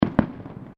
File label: Fireworks Multiple Firecrackers